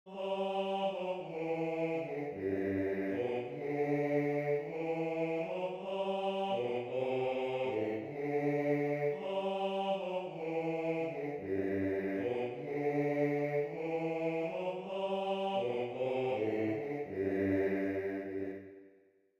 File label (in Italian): alleluia basso; alleluia irlandese